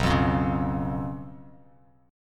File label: B Suspended 2nd Flat 5th B Bass Standard; strummed; JGuitar